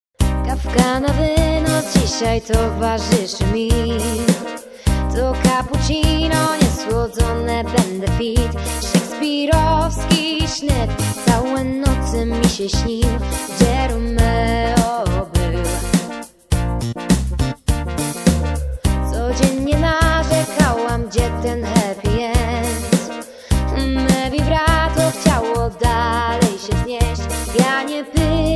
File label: jazz